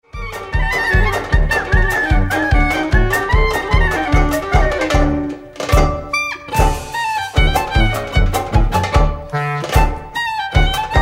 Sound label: Bulgar, Swing Klezmer Orchestra